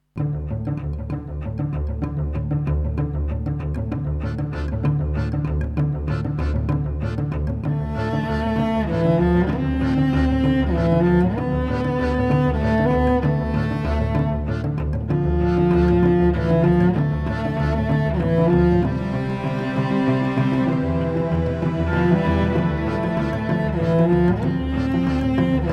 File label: Cello